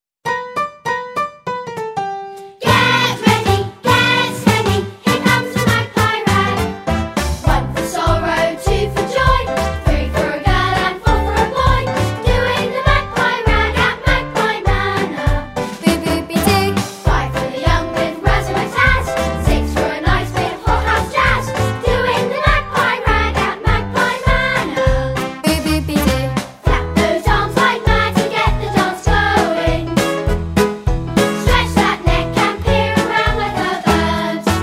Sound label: The Magpie Rag